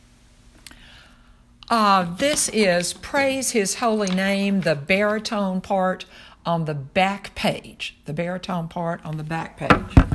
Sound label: baritone